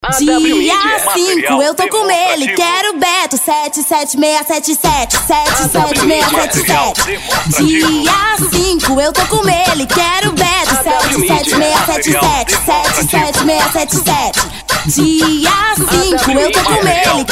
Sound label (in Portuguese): Jingle Politico Deputado